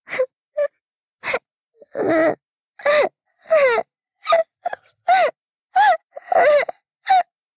cry

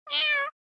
meow

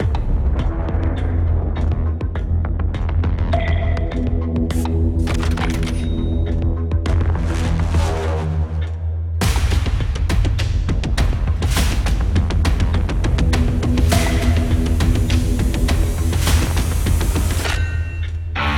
bgm battle deep echo

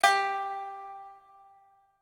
pipa

